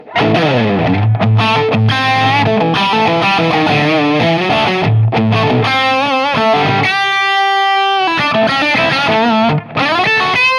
Medium Lead Tele bridge pu Humbugging Modern Crunch channel; Joe Barden; gain 12; treble 12